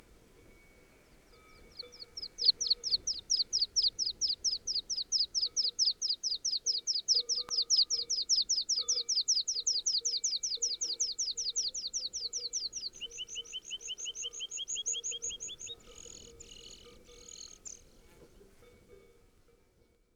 Bergpieper Gesang

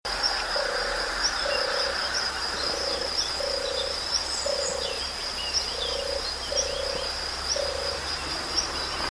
Wasseramsel trrru

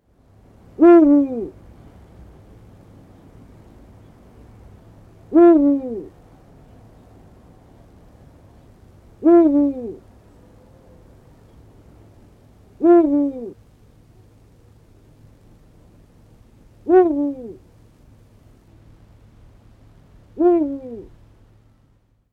Uhu Balzruf

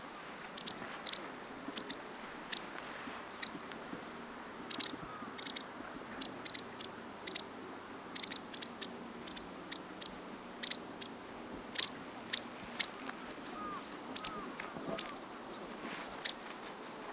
Bachstelze Ruf

